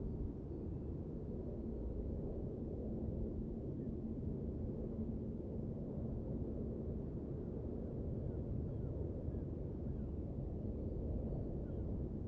amb brekkie indoors

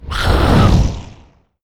Fire Elemental Die

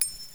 Ding; idle champions of the forgotten realms gamerip 2020

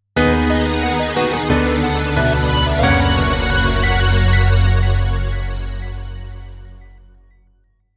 Game Over; Muchi Muchi Pork; Pink Sweets

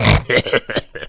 Pig Laugh

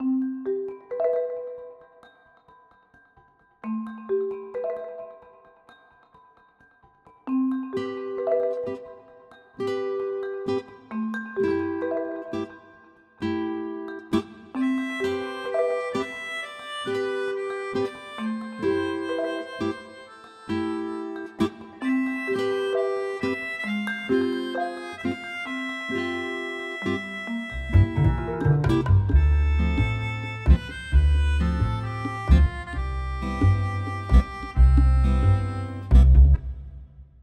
bgm 132bpm

